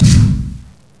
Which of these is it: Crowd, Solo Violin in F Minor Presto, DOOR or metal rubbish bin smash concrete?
DOOR